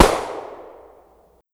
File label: gunshot - originally sampled sound